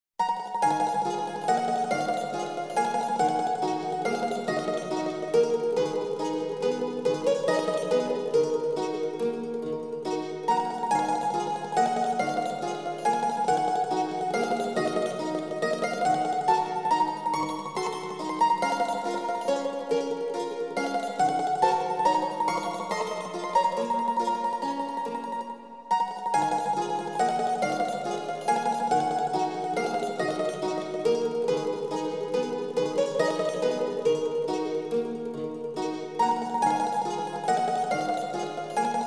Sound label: Venetians - Gondola-song; anno 1503 gamerip